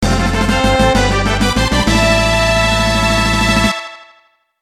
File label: SNES Victory